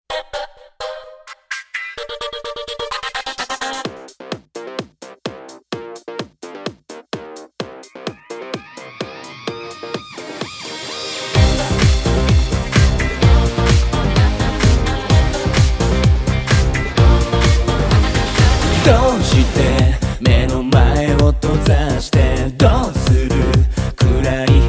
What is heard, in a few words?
Male Vocalists; Z-I-v Summer Contest 2013